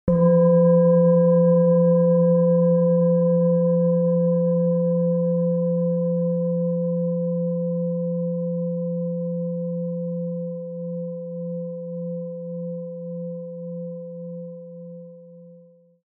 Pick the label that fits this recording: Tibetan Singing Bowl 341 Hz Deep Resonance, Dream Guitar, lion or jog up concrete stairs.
Tibetan Singing Bowl 341 Hz Deep Resonance